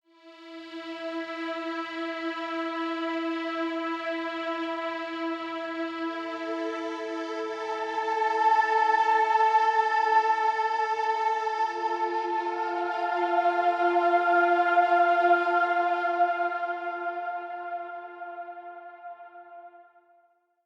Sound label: Hangar Ambient Random; Ambient One Offs; Warframe; Railjack